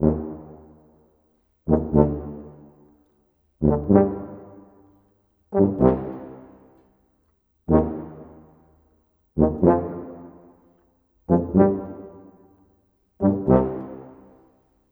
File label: Trombone Tuba; Sonic Foundry (Sony Creative Software); Orchestral Series VOL-4 - Rock and Pop